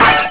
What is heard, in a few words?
smash; Ronin